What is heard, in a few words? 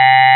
android, Digital Synths